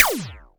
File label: Laser Gun; Game Sound Solutions - 8 bits Elements; Power Laser Guns Demo